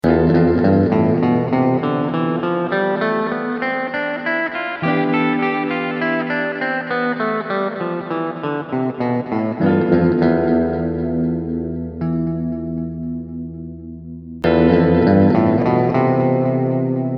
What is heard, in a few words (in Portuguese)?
Modos Gregos Mi Frigio